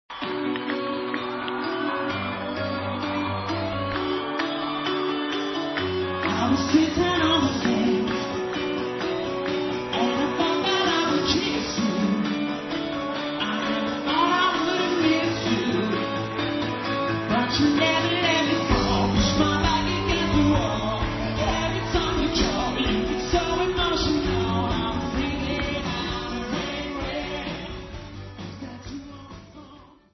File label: ring ring